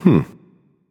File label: notice; Smite; baronsamedi